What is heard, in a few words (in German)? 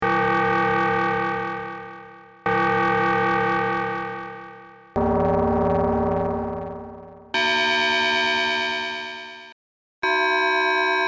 quad operator Glocken